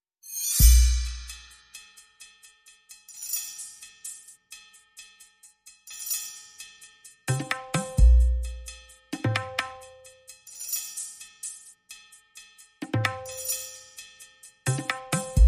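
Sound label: Percussion and Pulses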